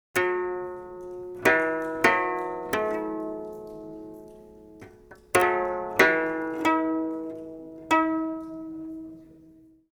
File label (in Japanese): koto